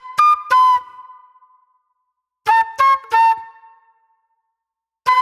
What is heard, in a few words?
Soul-Jazz Flute, Call And Response